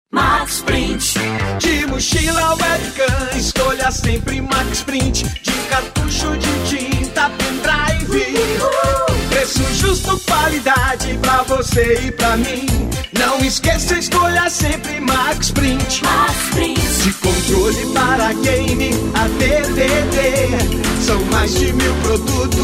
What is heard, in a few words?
jingle; MAXPRINT